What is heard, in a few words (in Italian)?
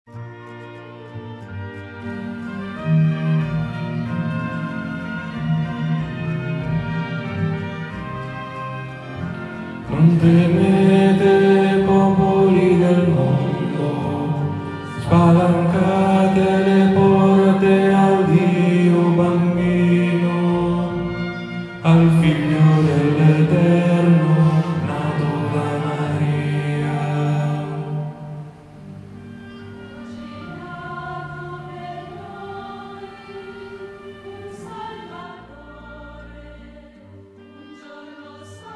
Non temete popoli del mondo Contralti